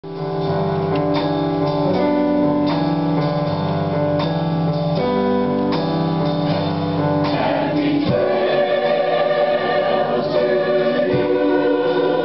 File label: Choral